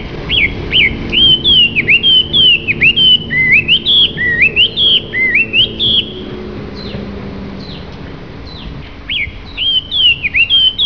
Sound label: Hwamei